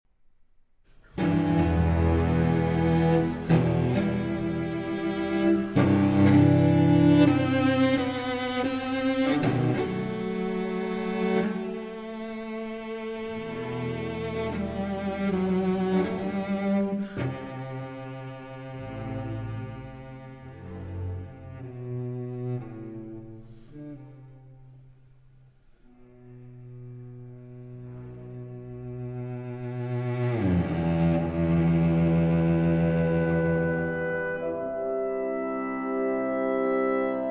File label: Cello Concerto in E Minor, Adagio - Moderato; Elgar; Op. 85 - 1.